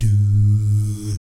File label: DOO SFZ, Jazz FX; Spectrasonics; Vocal Planet; JAZZCOMBO